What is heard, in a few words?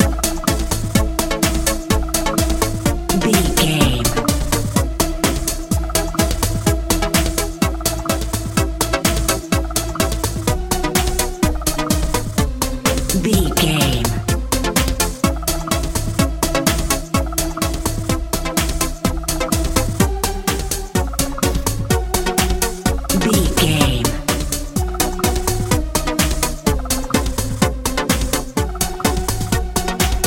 modern dance